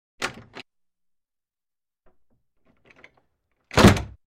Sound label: Door Wood Open-Slam; Doors, Squeaks